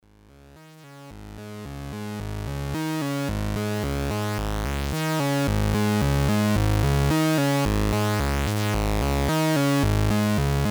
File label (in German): ACL Variable Sync VCO Sägezahn Invertierter Sägezahn Dreieck Sinus Pulswelle manuell